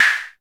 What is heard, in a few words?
snare classic; snares